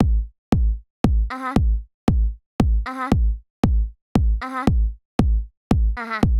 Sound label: drum; Pony Fan Music Remix Stems